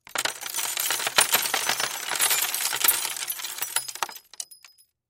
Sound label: Crash Debris Glass On Wood; Sound Effects:Materials; Blastwave